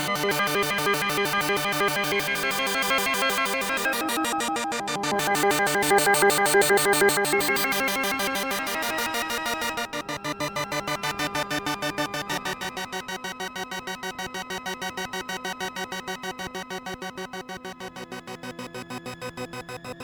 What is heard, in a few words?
G fifths